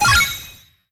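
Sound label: System Bonus Balloon; Disney Star Smash; Android, iOS, Mobile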